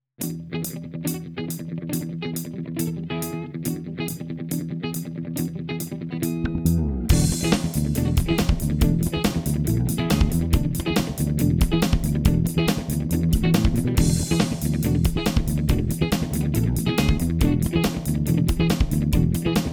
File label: bypass; Maxon VJR9